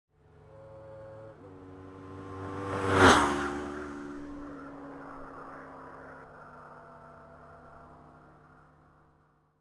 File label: Honda VFR